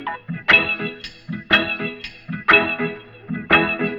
120 E maj Skank Roots; Zulu

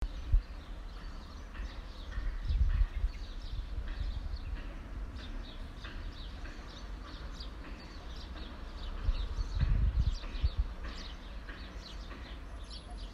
Outside My House

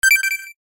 Ui Confirmation Alert

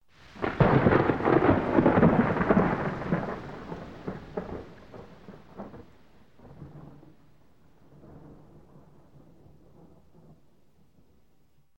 Thunder Strikes Lightning Storm Claps

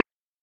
Points Tick

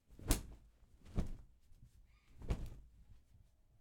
Towel Whip

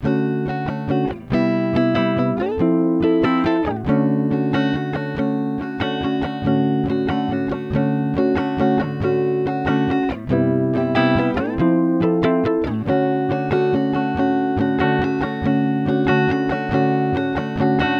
Soothing Tranquil Solo Guitar In 3 4 140bpm

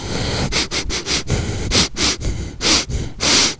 Sniffing

